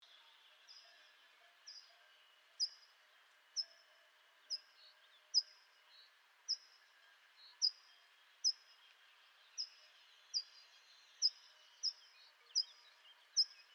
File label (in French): Grosbec cassenoyaux